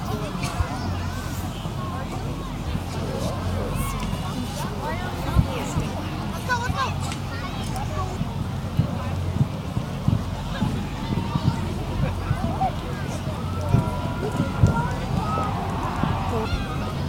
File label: crowd; Golden Crayon Sounds